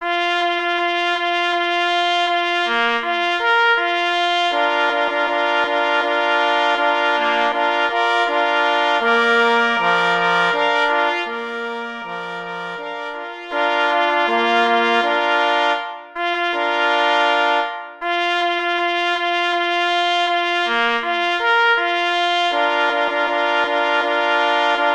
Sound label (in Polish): na 2 plesy i parfors; Henryk Maka; Chwal Cwik